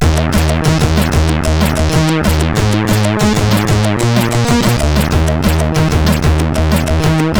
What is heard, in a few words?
Bass, sid CSG8580R5